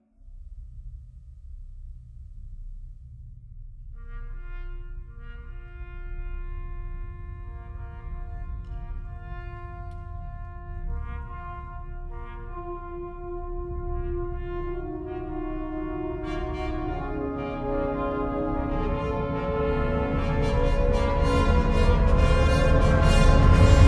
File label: Drums of Thunder